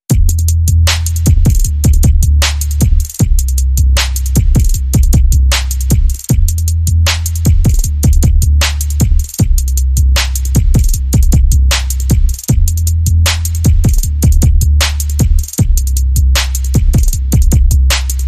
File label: Drum and Bass